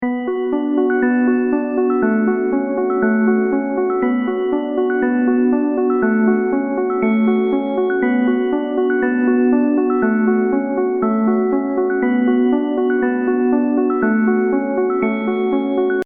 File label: ringtone